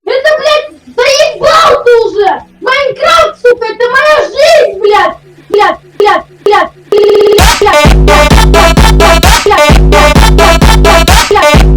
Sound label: Phonk Slowed; MINECRAFT PROFUNDAS